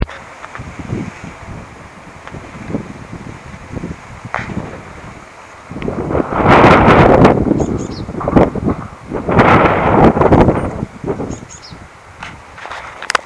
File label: having a pair already